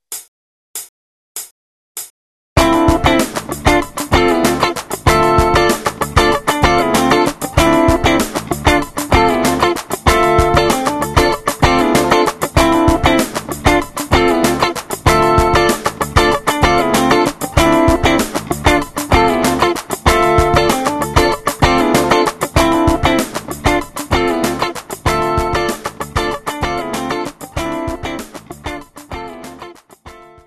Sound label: funk; funky